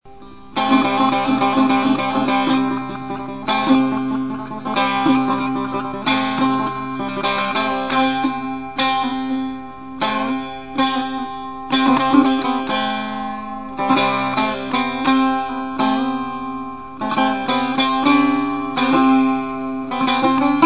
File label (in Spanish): chahargah daromad, Musica persa